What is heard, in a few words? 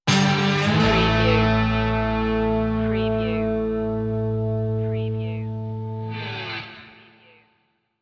urban country stinger